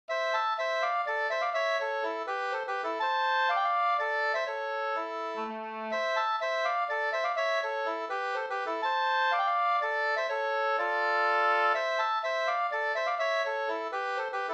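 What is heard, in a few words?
polska, two-part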